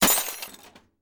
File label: metal tools drop